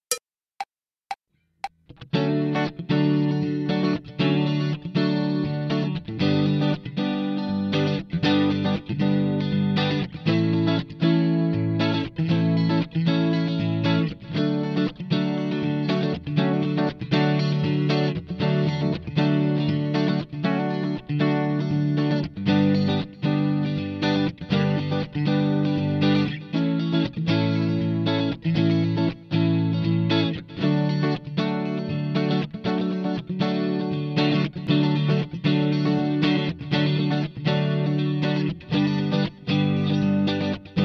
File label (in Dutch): Improvisatie